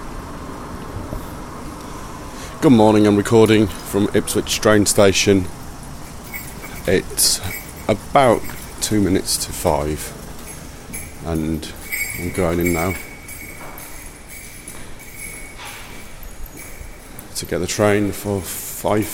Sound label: ipswich railway station at five in the morning boarding train